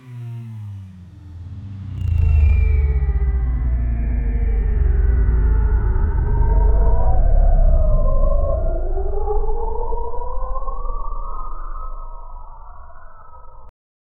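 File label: moving futuristic, flying car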